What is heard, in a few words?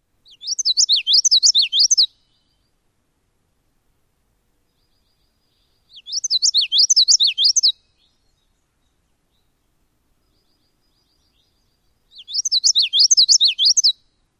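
Common Yellowthroat songs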